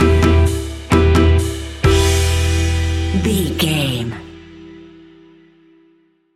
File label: ska madness stinger, Classic reggae music with that skank bounce reggae feeling.